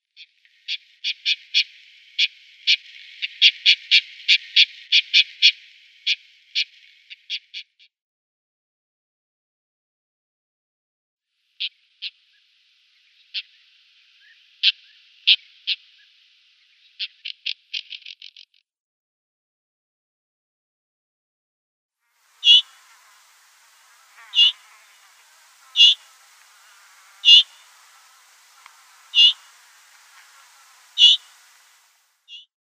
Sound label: White-crowned Wheatear calls